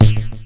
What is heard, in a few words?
land on head bonk; Nickelodeon Basketball Stars 3; ThrillGames